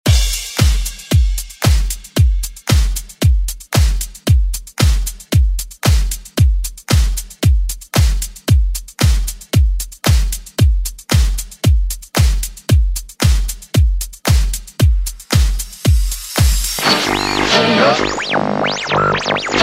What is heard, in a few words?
80s ReDrum; DJ Mhark